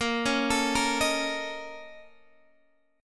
A sharp Minor Major 7th Double Flat 5th A sharp Guitar Half Step Down